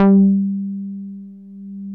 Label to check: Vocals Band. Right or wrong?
Wrong